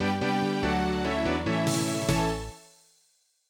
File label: 1UP; Sonic Robo Blast 2